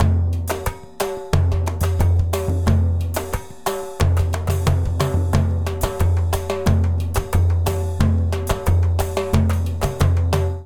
Murga 90bpm, Latin Percussion; Ableton; Factory Packs

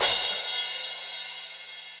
Crash 16 Direct Natural, Cymbal; Drums; Drum Hits; Standard Hits; Session Drums Studio

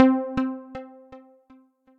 All Around Echo, Synth Lead

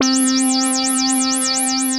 Synth Lead; Poli; Lighthouse

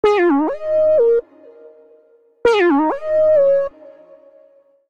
Bb Minor 89bpm; MIDI Clips; Melodic; Evening Casual; Ableton; Factory Packs; Chop and Swing

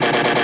134 Garage Guitar Fill Em; Dance DJ

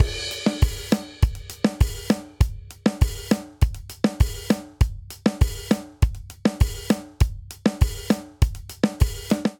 Reggaeton Chorus 100 bpm; 4 4; MIDI Clips; Ableton; Factory Packs; Drum Booth

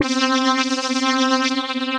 Capacitorpad, Synth Keys; Ableton; Creative Extensions; Poli

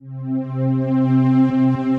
Synth Pad

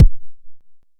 analog quick, kick; Ableton Foundation FreePacks

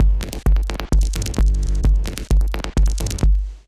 Kit groove 130bpm; MIDI Clips; 122 - 130 bpm; Onomatopoeia Kit; Ableton; Factory Packs; Glitch and Wash

